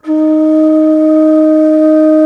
T. BONSUR, India Winds; E-MU Producer Series Vol. 7 – Old World Instruments

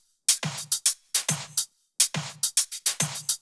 Shuffled Loops, 140 BPM

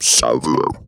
Funky Vocals; USB Soundscan vol.18; AKAI

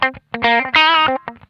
Funk Guitar; Zero G - Funk Guitar

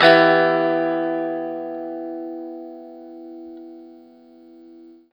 PRS CHRD MIN, Guitar; 90 s Sample CDs; Quantum Leap - Guitar & Bass

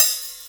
JD800 Dance Card Drums

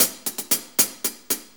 6-8 HiHats, HiHat Ride Loops; Drum Tools

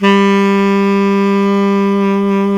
SAX Tenor Breathy G 3

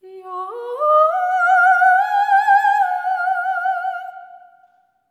SERENE, Symphony of Voices; Spectrasonics

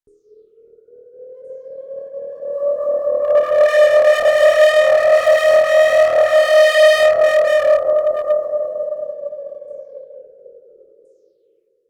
D ghostly 7th, pad

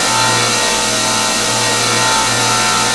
A#3 CRYST, ELECTRO GLASS; Northstar; The Wizard VOL-1

